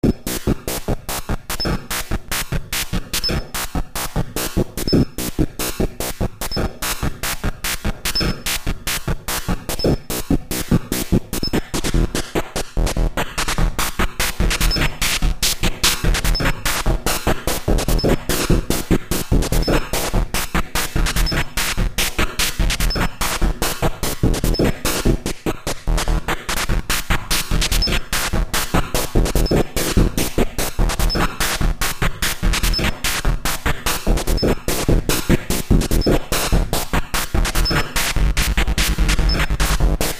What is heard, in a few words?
atari jam; Synthcart